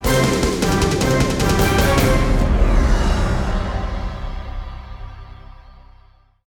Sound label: Combat Death; fat princess adventures; ps4; Cake Or Death